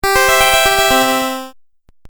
Game Music Phrase, Video Game Sound Effects

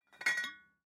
Teapot Lift Lid, Teapot Lift Lid Antique Tea; Tea Kettle; Household; Dishware

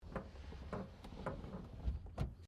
CAR MAZDA RX7 SERIES3 EXTERIOR WINDOW CLOSE

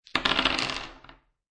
Dice Throw Five Dice On Wood Table; Casinos; Lynne Music

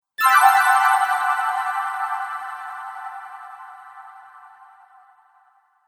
Ethereal Magic Sparkle; Magic Sound Effects

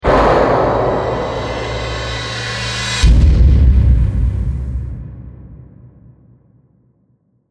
First Strike Stinger; sound design; Stinger Sound Effects

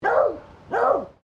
ANIMAL DOG BEAGLE FEMALE BARK; Various domestic dogs; Dogs (Sound Effects:Animals)

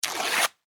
Foley Cloth Rip Fabric Tear; Ripping

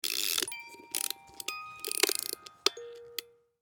Foley Snow Globe Wind Up; Wind Up Toy Sound Effects; Wind Up Toys (Sound Effects:Toys); SFX Bible 6

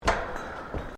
Footsteps Boots Suspended Metal Walkway Step; Footstep Foley; Human

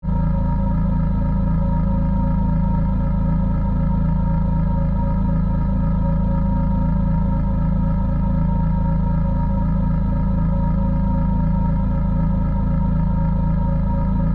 Force Field Energy, Source; BlastWave

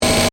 Distortion Glitch, Static; Production Element, Imaging Element, Accent, Transition; Distortion Sound Effects; Blast Wave

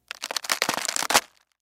Cabbage Open Tear Stress; Food; Vegetable; Foley; Household; Blastwave

